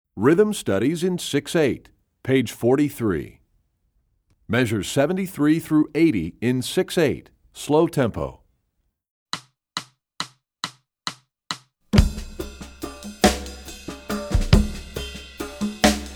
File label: Rhythm Studies, Percussion; EE2000 Book2